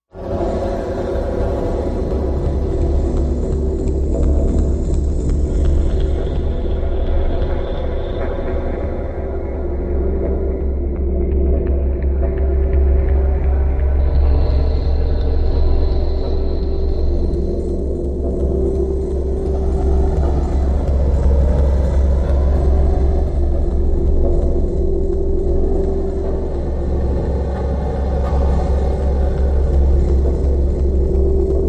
Persist, Machine, Ambient Space Factory, Tapping, Airy